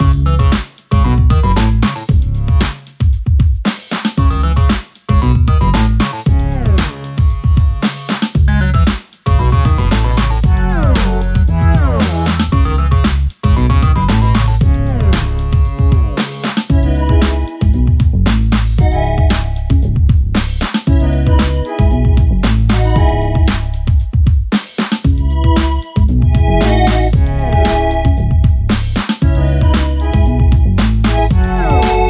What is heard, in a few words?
Loop